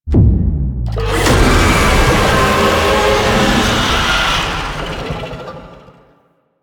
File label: Tank Infested Vocal Healing; Enemies; Barks; Warframe; Lasrian